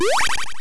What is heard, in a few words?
heal; MMBNMMOP